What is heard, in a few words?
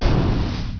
POOF